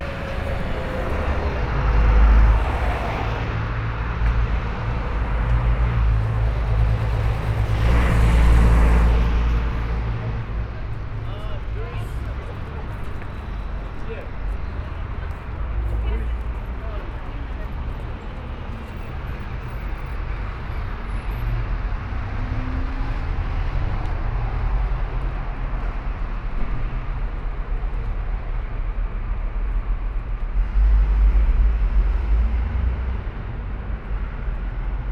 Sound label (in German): London Strasse; Walkman Performance